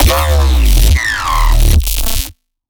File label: growl attempt, bass